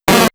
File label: snorlax cry; pokemon x y